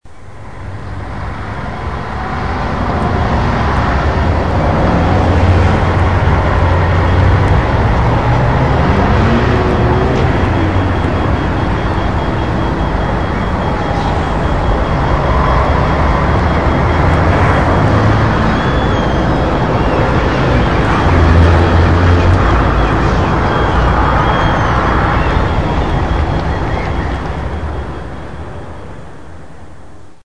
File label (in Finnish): liikenne